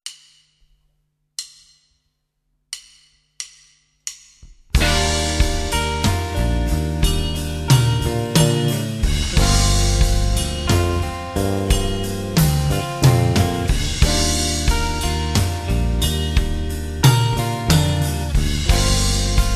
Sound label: Tapping With 8 Fingers Backing Track